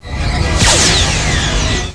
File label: borg beam